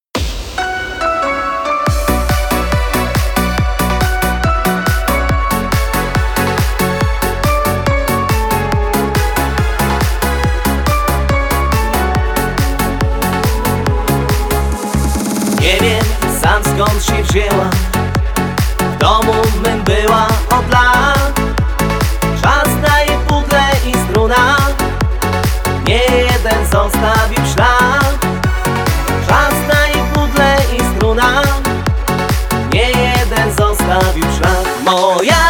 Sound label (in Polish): moja gitara